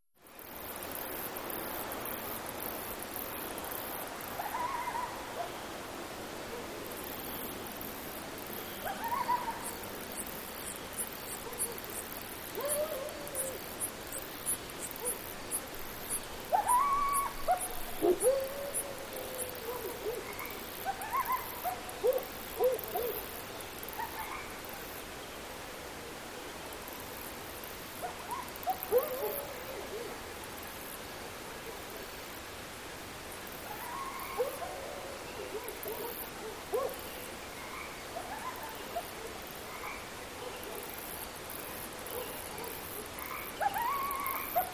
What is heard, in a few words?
Continuous Coyote / Dog Barks And Howls With Crickets And Frogs In The Background.